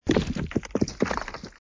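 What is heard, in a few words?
after explosion; chicken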